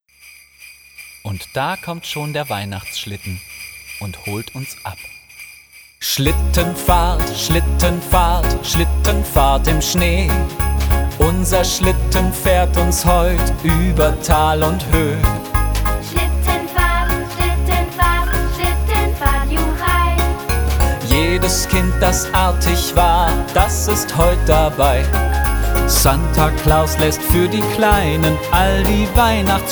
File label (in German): Schlittenfahrt Jingle Bells